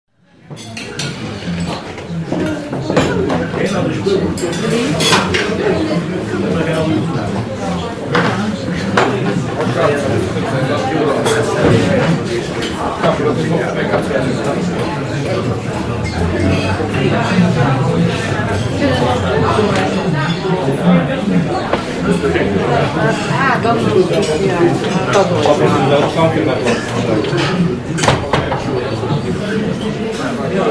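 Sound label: sounds of bar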